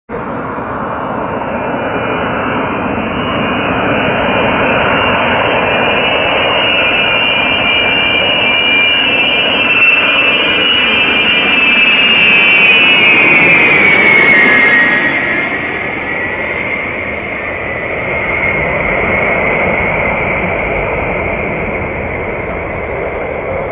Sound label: usaf b52h landing; egva